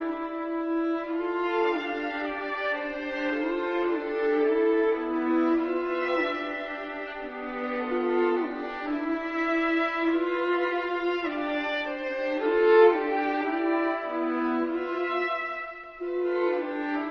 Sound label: strings ascension, sad; Loops